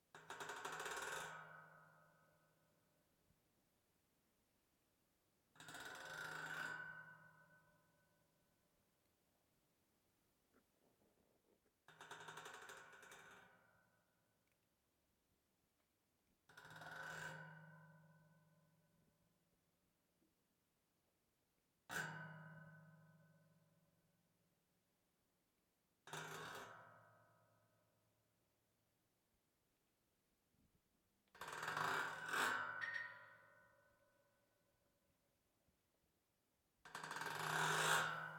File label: Metal Bar Rattling